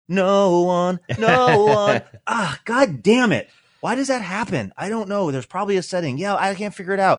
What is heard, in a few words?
singing no one saying agh goddamn it